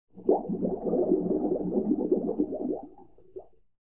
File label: bubble